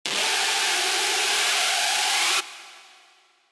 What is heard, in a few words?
Gardens Screech F